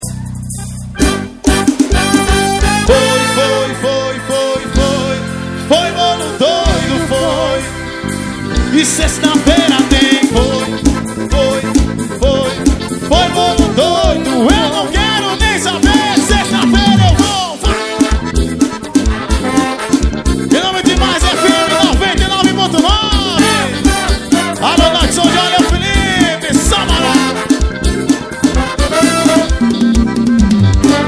forro

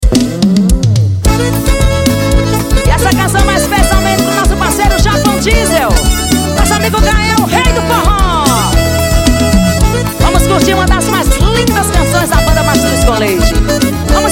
forró

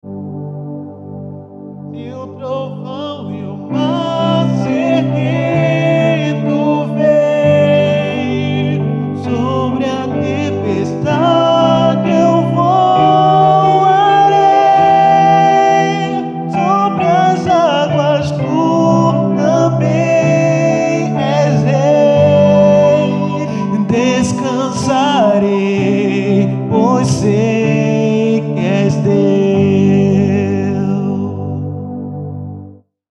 voz e teclado; cover

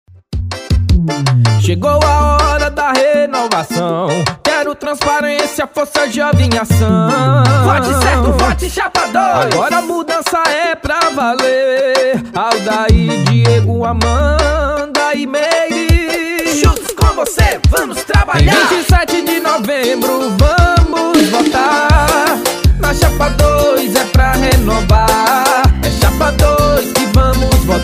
jingles politicos, musica para chapa de eleição; Jingles Para Ineternet

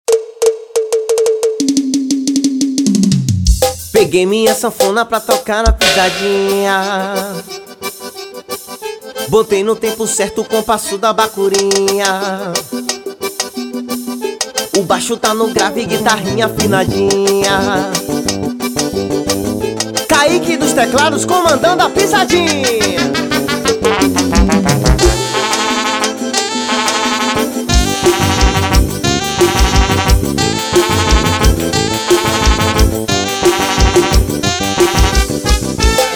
passagem de som com os instrumentos

